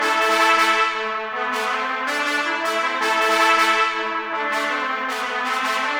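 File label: Trumpets staccato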